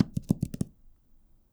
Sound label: Hardcover Taps; Books, Paper, Writing